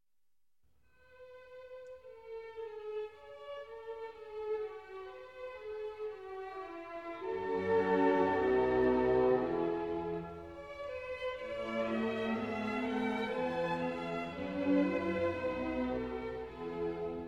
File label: Violin Concerto in A Major; David Oistrakh; Mozart; Violin Concerto No. 5; Turkish